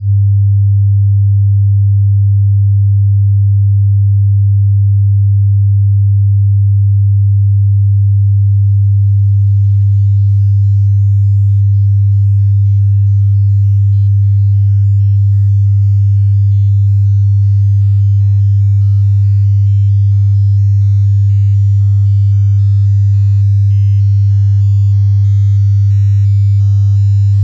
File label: Dsp drone